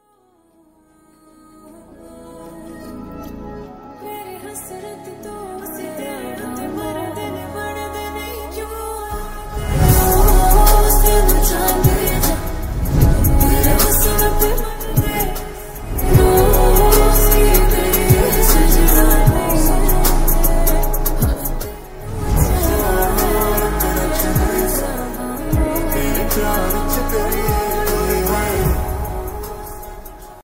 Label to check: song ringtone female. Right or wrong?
Right